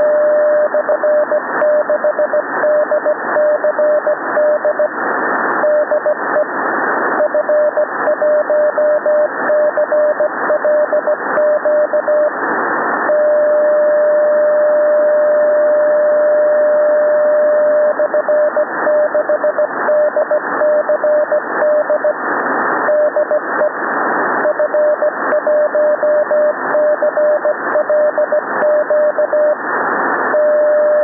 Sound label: 122GHz CW1500Hz; trafic